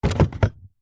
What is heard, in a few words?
household drinking glasses new slide in or out of box